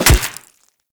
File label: Melee Staff Impact Flesh; Staffs